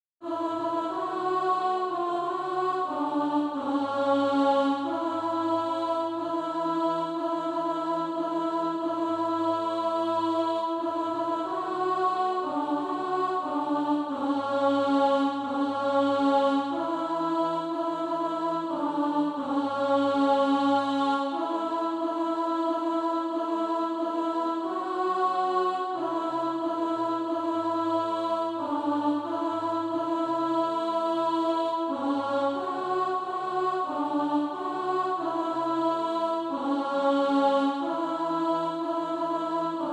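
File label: alto, Practise Music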